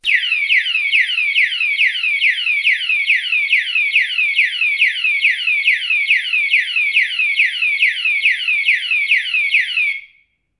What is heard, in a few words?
alarm; Golden Crayon Sounds